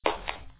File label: footstep soft